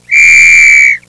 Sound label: police whistle